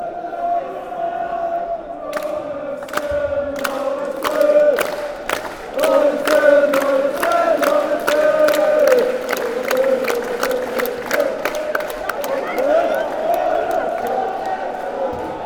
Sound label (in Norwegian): aalesunds aalesunds aalesunds, fanchants